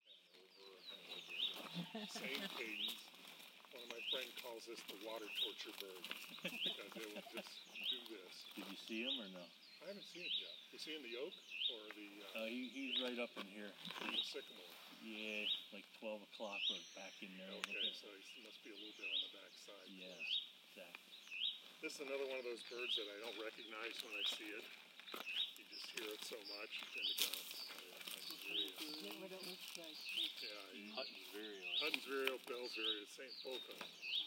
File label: Huttons Vireo songs human chatter